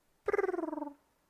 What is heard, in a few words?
Creatures jellyfish sleep